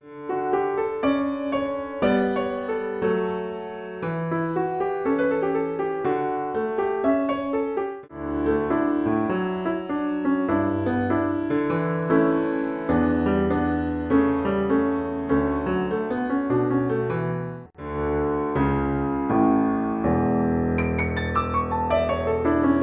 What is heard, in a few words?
advanced piano solo